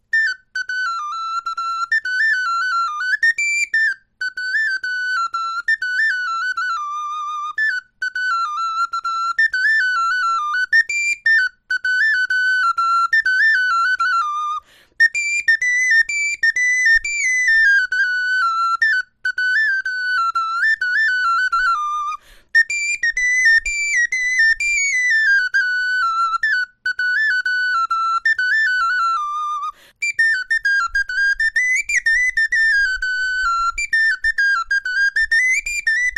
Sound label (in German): melodie